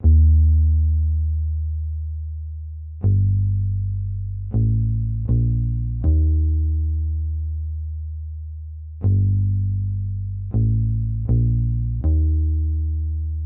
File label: 80 BPM G Min Bass; Loop Stems; Cymatics; Harmony